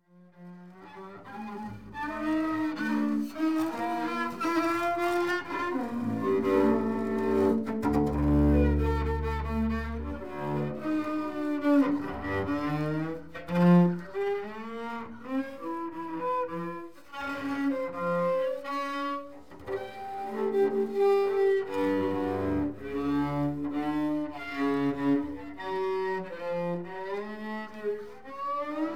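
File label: learning to play the cello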